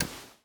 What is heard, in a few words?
TV On; MiSide